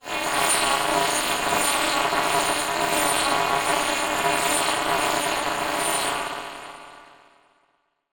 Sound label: Transition Sweep Scanner; Production Element